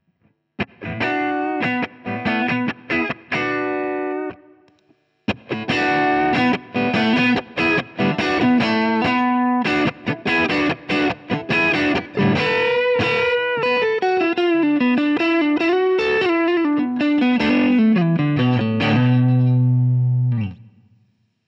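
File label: boost, guitar; JHS Prestige Princeton Boost 12 Uhr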